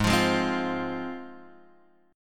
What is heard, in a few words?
Ab 6th Ab, Guitar, Open G